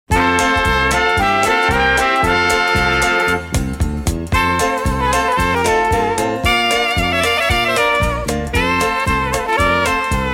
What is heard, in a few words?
quadrille, instrumental